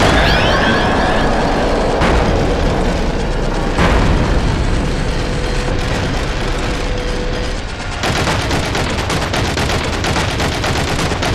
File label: DOOR SLAM; OFF 2025; Switch Windows